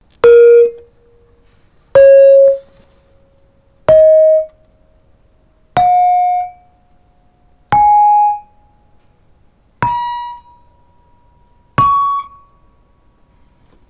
Saron mittel kurz, gamelan; HB-Samples

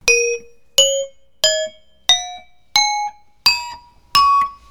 Saron mittel kurz Spitzhammer; Indonesien; HB-Samples

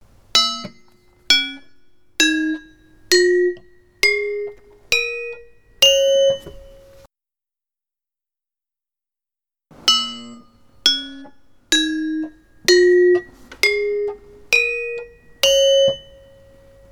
Saron gross abgedämpft Spitzhammer; HB-Samples

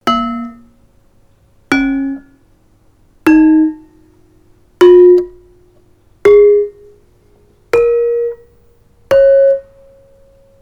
Saron gross gedämpft, gamelan; HB-Samples

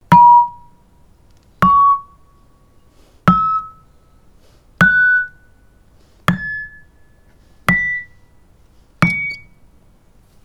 Saron klein kurz; HB-Samples

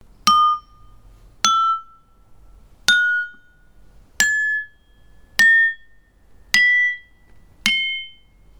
Saron klein Spitzhammer extremkurz; Indonesien; HB-Samples

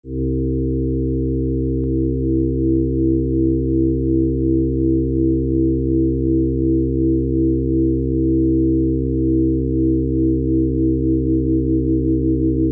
obertonschwebungen stereo

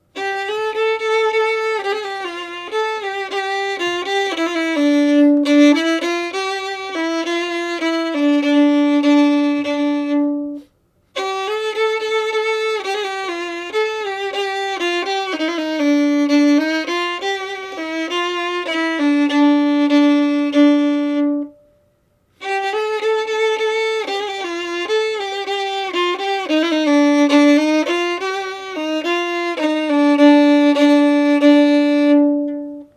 geige; Arabien

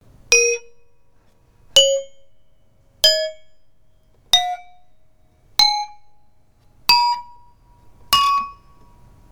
Saron mittel kurz Spitzhammer; HB-Samples

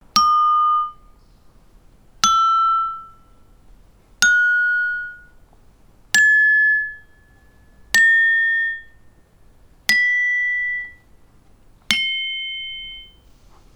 Saron klein Spitzhammer kurz; Indonesien; HB-Samples

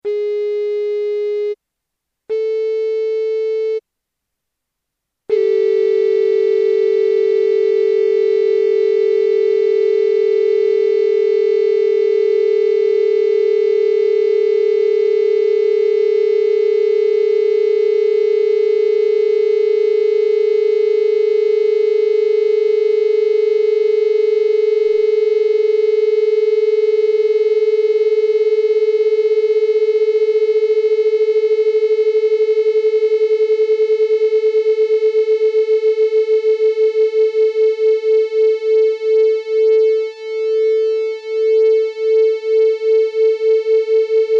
schwebungsexperiment stereo

